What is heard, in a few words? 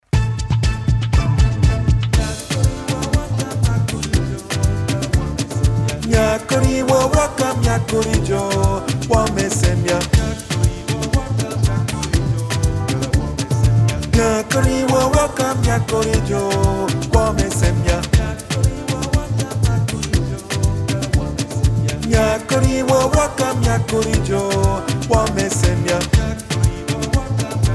Bass